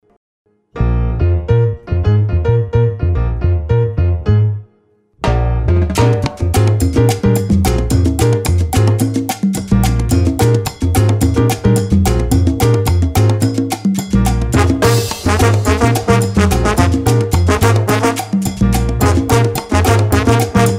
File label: Salsa, 217bpm